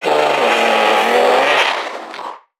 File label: Creatures Vocalisations Infected; NPC; Five Nights At Akers; soma; npc construct